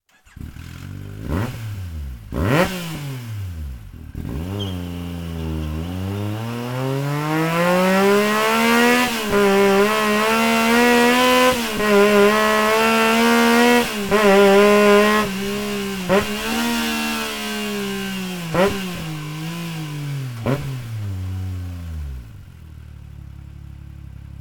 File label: akrapovic evolution exhaust yamaha yxz1000 r